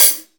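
raw pedal hi hat sound e key, high-hat sample tuned to the E note. Loudest frequency: 10547Hz; Royality free high-hat sample tuned to the E note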